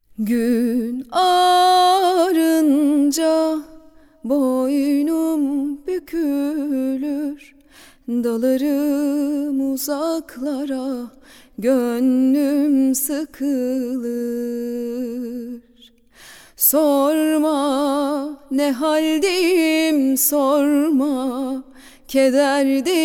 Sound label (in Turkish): vokal